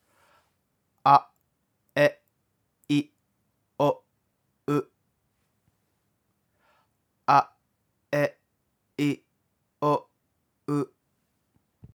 母音発音ok

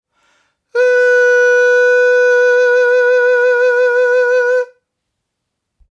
裏声支え

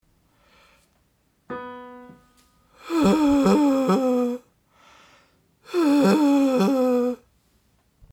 ピュアファルセット低すぎた結果

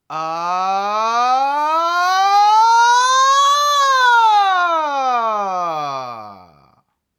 声区融合

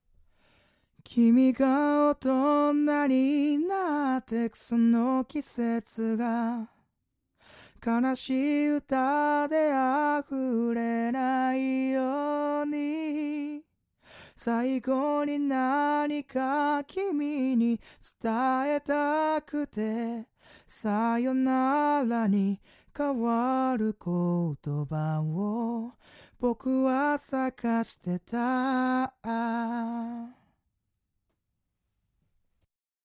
見本 サビ

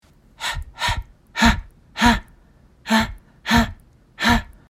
明るいため息

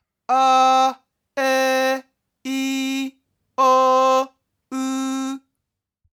地声音程付き

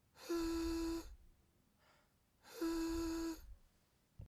純度100裏声

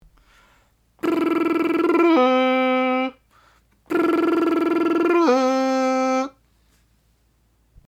裏リップリールから失敗して地声

